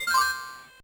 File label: Jingle, gba